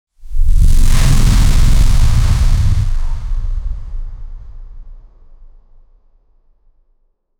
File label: fx motion blur swoosh